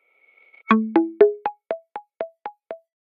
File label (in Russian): originalnye ringtony i uvedomleniia nokia 808